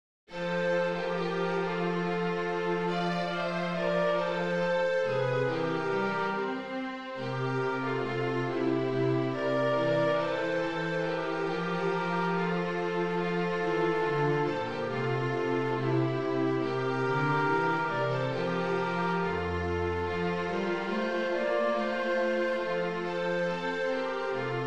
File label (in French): instrumental